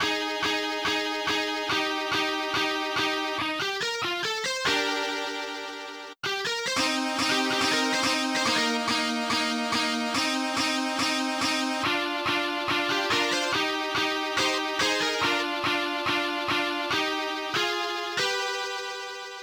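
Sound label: band electric guitar; BGM; infinitefusion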